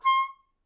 Melodica Short; Short Notes Chromatic

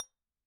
Bottle Struck

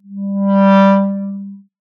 Clarinet

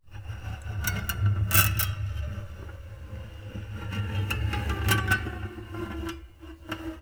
String Scrape, Piano; Piano Miscellaneous

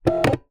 Single Shunt, DVD Drive External

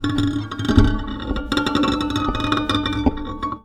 Classical Guitar Extended Technique; Classical Guitar Extended Technique Mono

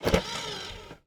Eject, DVD Drive Internal

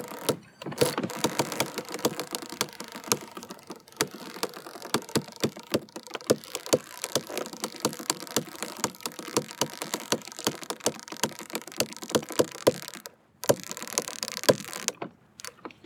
Sailing

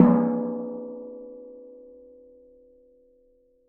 Strike, Washing Machine Drum Outside

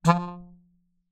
Melodica Short Note

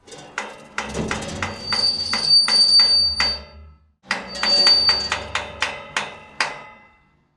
Turnstile

